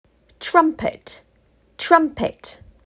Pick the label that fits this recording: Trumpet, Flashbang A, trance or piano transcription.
Trumpet